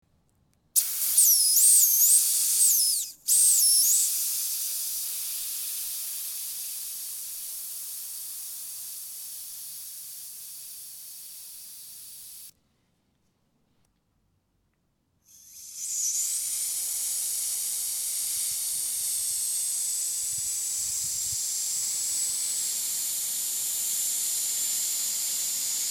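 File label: bicycle tire deflating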